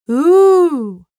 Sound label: Female Voice Ooh